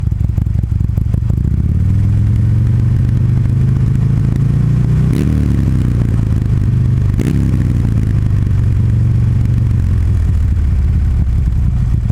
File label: Drummer loop